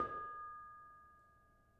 Bonang Panerus soft, gamelan

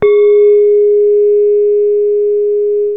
gangsa lo, gamelan; gravityharps

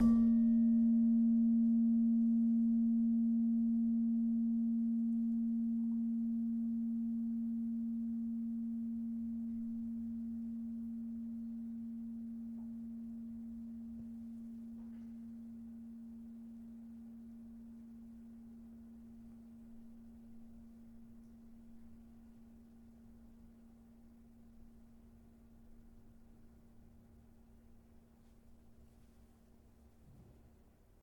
Slentem 3A Sharp, gamelan tuned

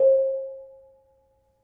Bonang Barung softer, gamelan

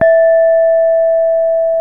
gangsa mid, gamelan; gravityharps

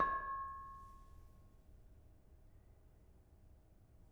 Bonang Panerus, gamelan

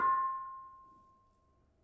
Bonang Panerus Pl 7, gamelan; gravityharps; timbrebox

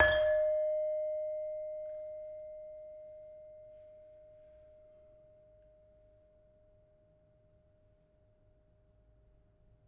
Saron wrong, gamelan

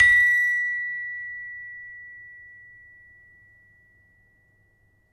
Peking hard, gamelan

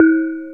reyong lo, gamelan; gravityharps

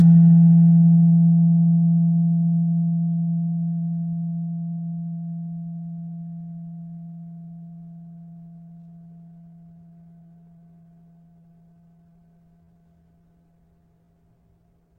Slentem 3 F, gamelan tuned; gravityharps

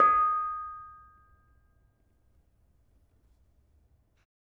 Bonang Barung, gamelan; gravityharps; timbrebox

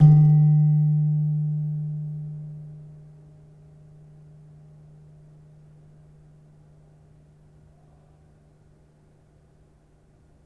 Slentem hard, gamelan

